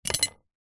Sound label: industrial wallpaper scraper set down on concrete surface; zapsplat